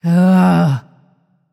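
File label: ugh, female voice; smite; voicepack; hera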